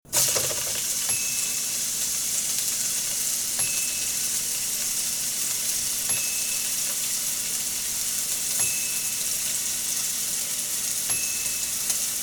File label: Light Sizzle in a Frying Pan